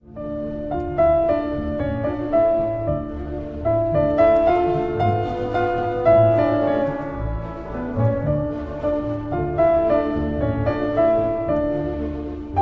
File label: Pianist